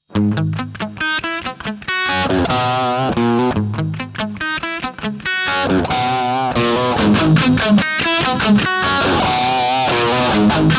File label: FX on off